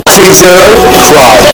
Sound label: cry loud